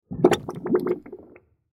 Mud Suction, Mud Suction Liquid Gurgle Thick Submerge; Lava; Foley; Blastwave